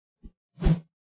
melee attack swoosh heavy; weapon swooshes; psfx

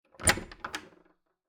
wooden open clean, doors

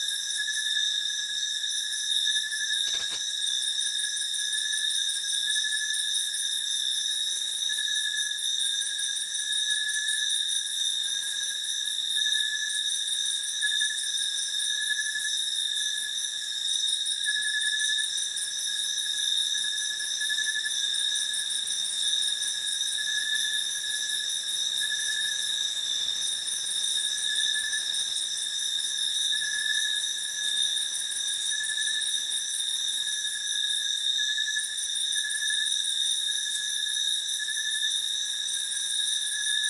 amb night clean loop; sonniss gdc

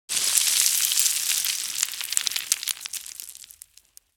crumbling rock slide; Sonniss GDC

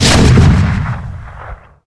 Air Burst; lancer weapon fx

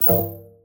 objects items interactions menus; sonniss gdc

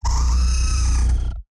mechanimal; sonniss gdc

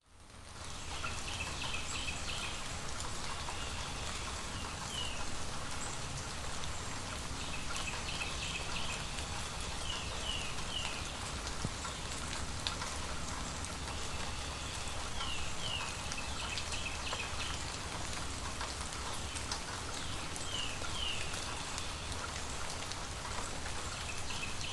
urban apartment porch rain; sonniss gdc

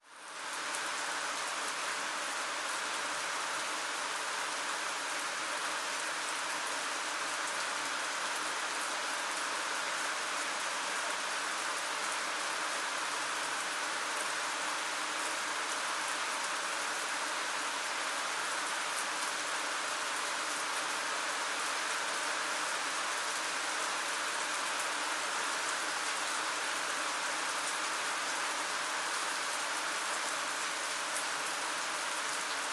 rain medium; Sonniss GDC

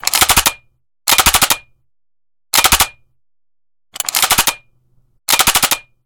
mechanism source metallic auto gun; sonniss gdc

